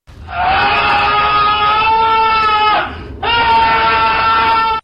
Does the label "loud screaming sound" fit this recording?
Yes